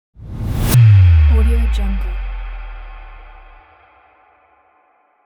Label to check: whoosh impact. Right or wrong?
Right